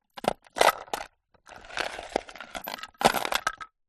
household jewelry and small makeup items pick up and drop in wood container